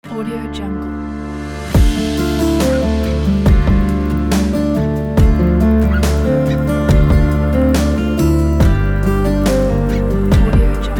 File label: acoustic way going